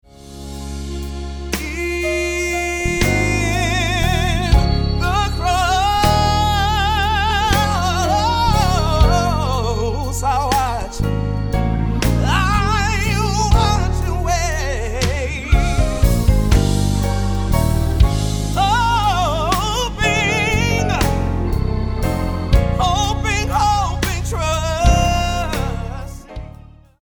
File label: Choir